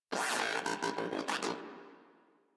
Moa aggressive vocal, pets; Studious; Warframe

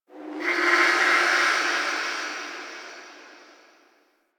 Whisper Air Draft; Warframe; Quests; The New War; Ambience; Zariman

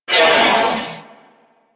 Purgatory Warrior Death, Barks; Warframe

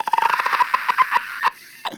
Infested Runner Patrol; Warframe; Runners

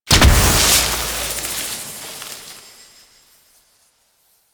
Gardening Plant Explosion; Warframe; Duviri

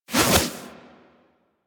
Alchemy Lab Add Mineral To Meal Throw Whoosh; Warframe; Hub; Liset Alchemy Lab

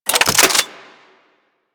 Rifle Reload; Warframe; Weapons; Bolto

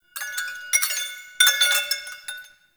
Witch Station Chime Jingle; Ambience; Soul Plane

